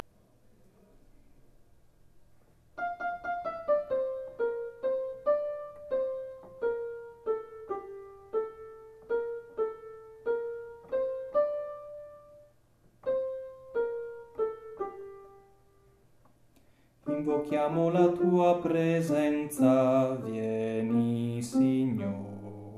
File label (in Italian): Contralti